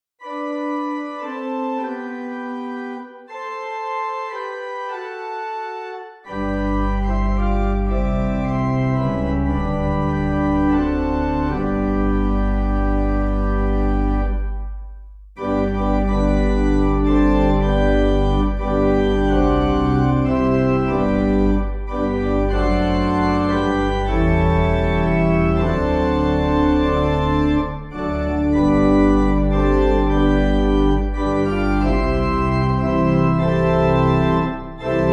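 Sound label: Pipe, Pipe Organ; As The Bird Flies Home; Sweney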